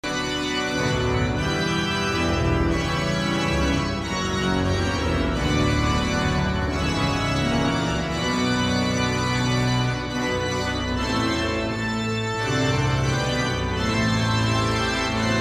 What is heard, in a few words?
Organ